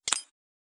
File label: gem landing; Recode; jewel Quest Riches Desktop